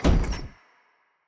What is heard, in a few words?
projectile; Shelter